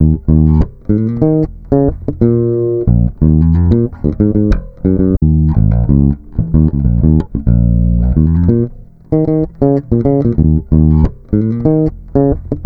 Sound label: Bass, Electro Lounge; Sonic Foundry (Sony Creative Software)